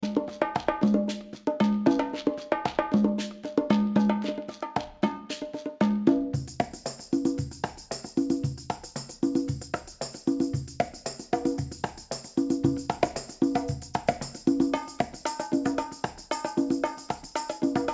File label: Tumbao, Latin Percussion